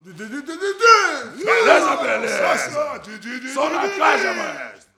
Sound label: BRAZIL, World Voices; ILIO; Vocal Planet VOL-5; RIO MADMEN